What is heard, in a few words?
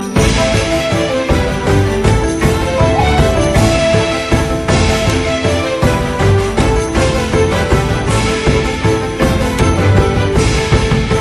Korean ringtone